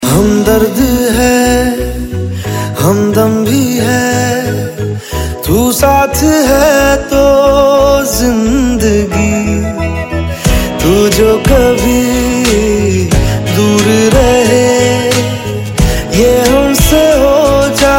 Male Ringtone